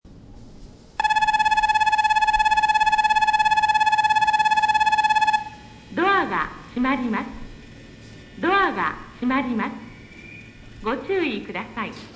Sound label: bell, station; tamatsukuri; osakaloop; jrwest